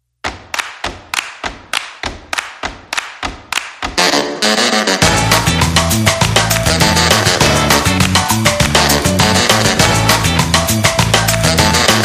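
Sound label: instrumental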